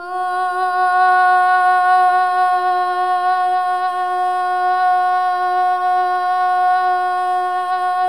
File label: SOLO BOY, OH; Spectrasonics; Symphony of Voices